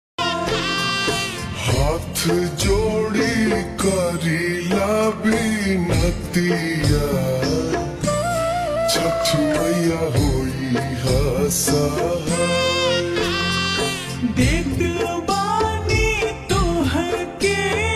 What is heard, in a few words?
Ringtone, slowed and reverb